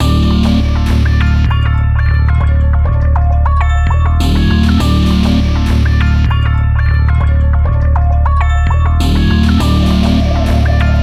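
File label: music underwater Radio; Nacht Der Untoten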